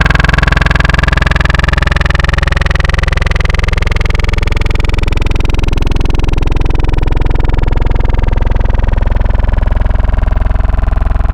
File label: Growl, wavetable